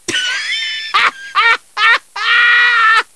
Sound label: haha; deadpool